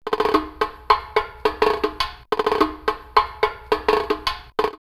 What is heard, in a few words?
Salvador 106, drumloops World & Ethnic; X-Static Goldmine